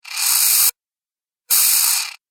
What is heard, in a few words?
Strange Scratch Sounds, Scary Tone Sound Effects